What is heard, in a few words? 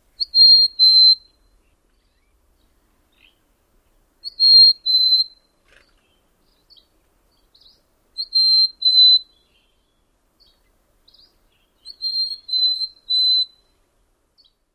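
songs, bird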